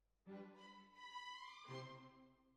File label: Cbasses